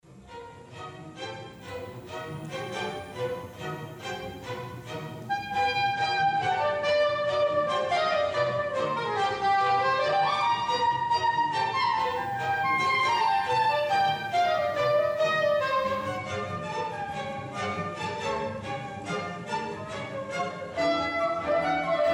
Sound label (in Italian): Concerto Per Sax E Orchestra